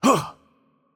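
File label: Hurt Light, Arabic; bloom minigame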